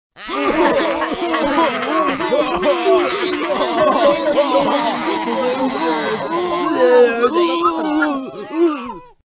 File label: mocking laughter; bfdi